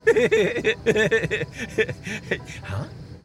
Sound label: he he huh